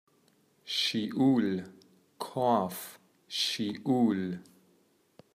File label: Cough